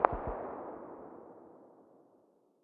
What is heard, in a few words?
hmg very far distance, 12.7x99mm; tacz-tweak-pack; taczmechanics